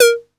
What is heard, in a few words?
808, CONGA, Electronic; Wizoo - Platinum 24 Electronic